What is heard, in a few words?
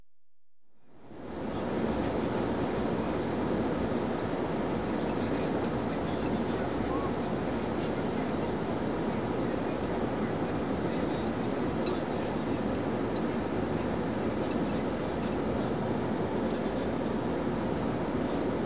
MID-FLIGHT AT SUPERSONIC SPEED, Aircraft; The BBC Sound Effects Library